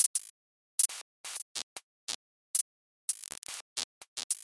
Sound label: Glitch